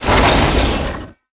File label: ice blast